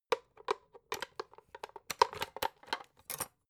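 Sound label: electric whisk patch handle, kitchen; haus-garten; kueche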